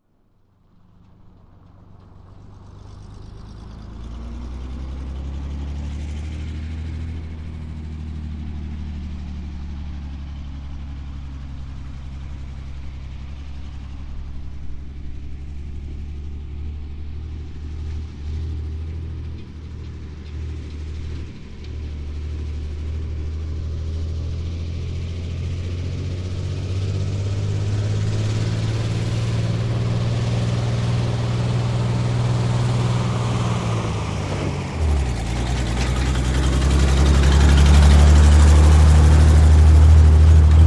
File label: Truck 2.5 T Slow By; 2.5 ton